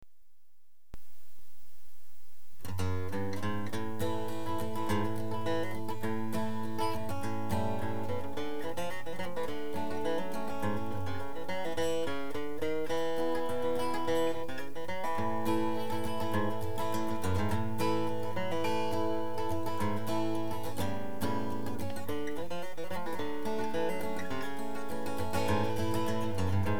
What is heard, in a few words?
chords